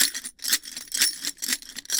Steam engine LOOP FOLEY Small iron pot filled with metal debris 120 BPM; Steam Engines; On The Fly Audio; Steam Engines Real & Foley